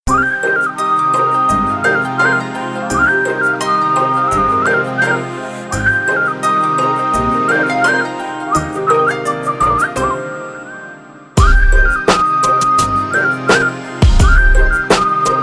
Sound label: Instrument